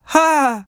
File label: Emote Male Happy; Idle Champions of the Forgotten Realms